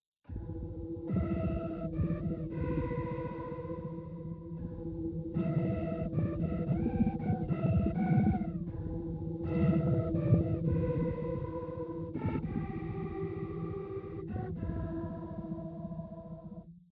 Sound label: Techno Noise